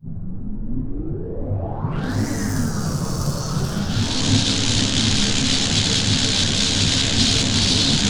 silo power; Dystopia; power old

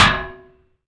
solid metal impact, Bullet Impact; Dystopia; physics

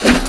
fist; Dystopia

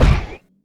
heavy vent, footsteps; player; heavyfeet; Dystopia

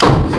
elevator start

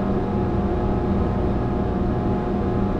laser room ambient; Dystopia

